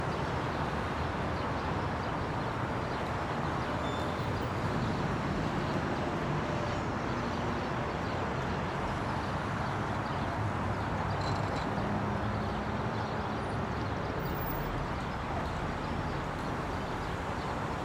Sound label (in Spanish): Ambiente barrio; Yesterday Origins